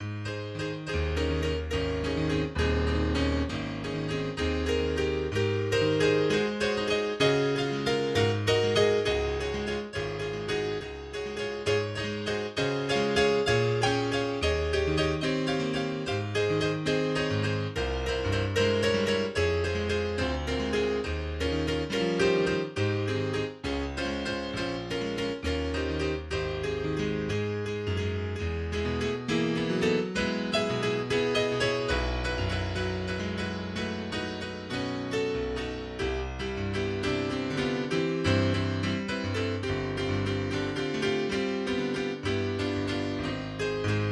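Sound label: in D Flat Major Allegretto; Etude No27; Three Etudes No3; Opus posthumous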